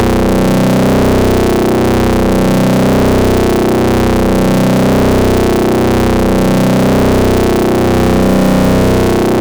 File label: BASS, Synthy Tonal; Distored Reality 1&2